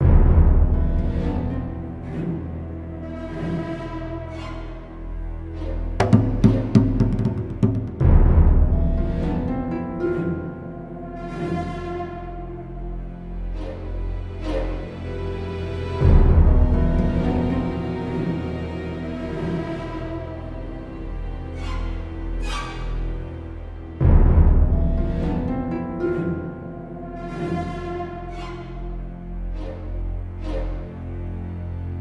Battle Suspence; Padana

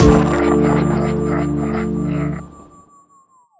Retry Jingle; Friday Night Funkin' Halloween mod OST mod Windows gamerip 2022

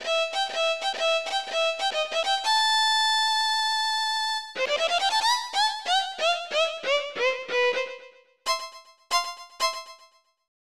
Violin Performance; Treasure Hunter G; SNES; Ponga's Violin Performance

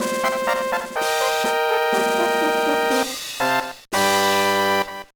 Jingle; Dan Doh Xi; GBA

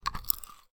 Eating Candy; don t be afraid the first toy

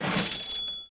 cash; Learn to Fly 2

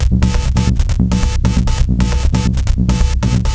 Alien Battle; Lego Battles 2009 NDS

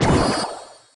attack; endless world idle rpg gamerip 2019

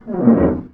Panel Waterwheel Open; Among Us

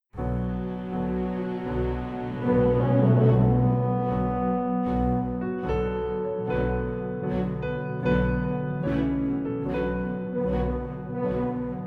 Piano Reprise; Isonzo

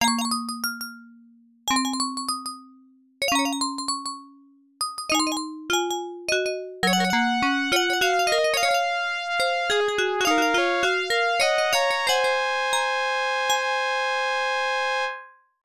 Orgel, Game Over; Mugen Senshi Valis II; X68000; Moonlight

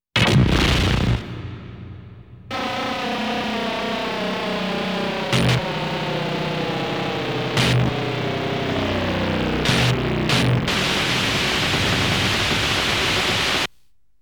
Emergency Landing, arcade

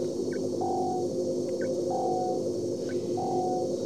Mucky Beep Repeatable; startopia

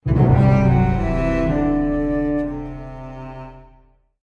Stage Lost

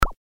POP

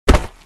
Poke Dirt

